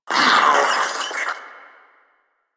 Proto Infested Maggot Awake, Vocals; Warframe

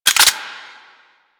Spear Gun Reload; Weapons; Warframe; Tenno; Hoplite

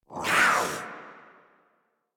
Kavat Vocal Attack Short; Warframe; Khora

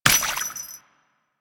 Steps Water, footsteps; Foley; Warframe; Grineer Royal Jester

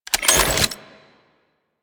Reload, Pistol; Weapons; Grineer; Amphis

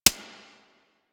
Pistol Mech, Weapons; Harlequin

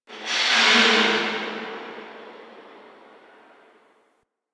Rail Jack Destroyer Machine One Off; Ambience; Crew Ships; Grineer

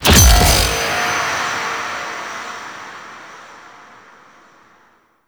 Lifepod Pickup; Warframe; Quests; Wraith Quest

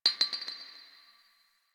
Garbage Glass Drop; Ambience; Warframe; NineteenNinetyNine

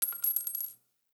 Shell Casing

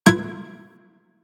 Shawzin Wrong Note E; Warframe

